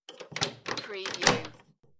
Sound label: Turn Key In Wooden Door; Sounds of doors and windows opening and closing, keys locking / unlocking doors